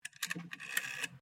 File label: TELEPHONE 1960S SINGLE PIECE ROTARY DIAL, old telephones; Telephones (Sound Effects:Electronics)